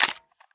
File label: mp7 clip out